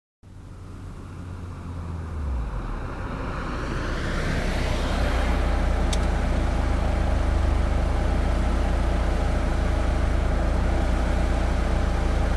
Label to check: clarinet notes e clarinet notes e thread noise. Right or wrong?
Wrong